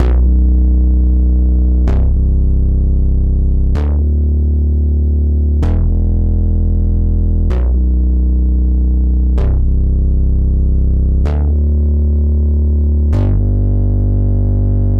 Poly Bass

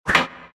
Wooden Spoon; Cookie Jam

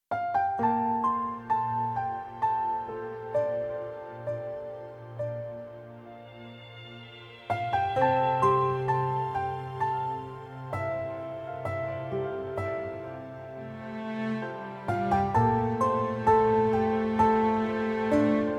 theme 65 bpm; legrand legacy tale of the fatebounds

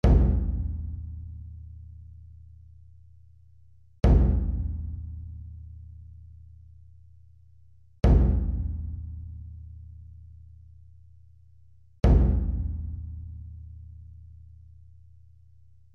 Kodo hits; raji an ancient epic

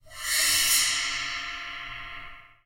explosion; CE Sixhands

